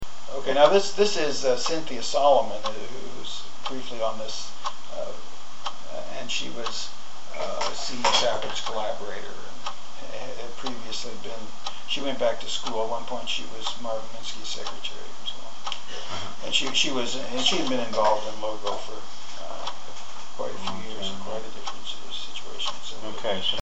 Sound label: bongo